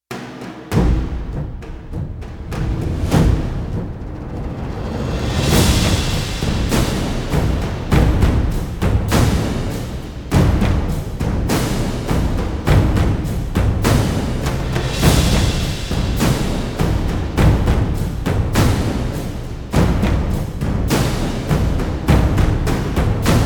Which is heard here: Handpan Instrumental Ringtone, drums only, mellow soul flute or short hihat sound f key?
drums only